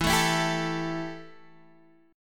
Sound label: E Minor Double Flat 5th E Guitar Open D